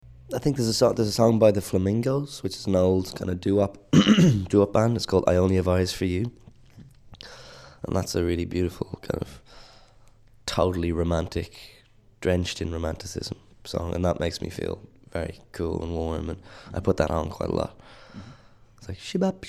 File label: song; villagers